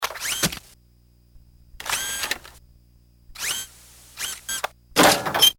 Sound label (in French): machine